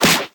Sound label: Punch short